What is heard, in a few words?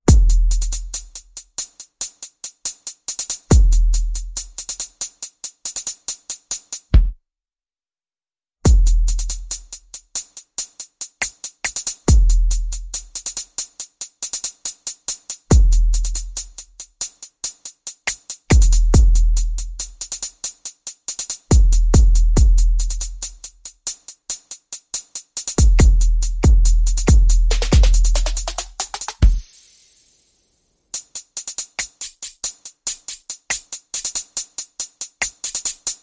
Bass and Perc Only